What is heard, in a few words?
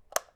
switch, UI Audio; Kenney's Sound Pack